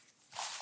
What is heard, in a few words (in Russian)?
Звук Шагов человека по траве